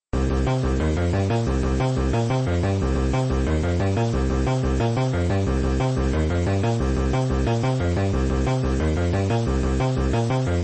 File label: bass